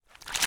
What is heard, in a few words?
Footstep Puddle